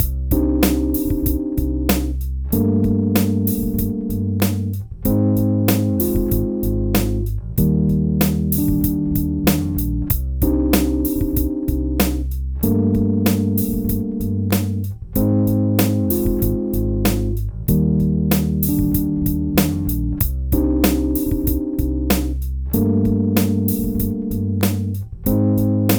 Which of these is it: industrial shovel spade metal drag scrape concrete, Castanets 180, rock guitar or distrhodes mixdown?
distrhodes mixdown